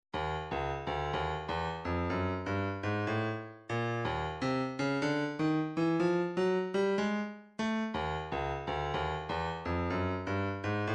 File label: 123 snare; thumper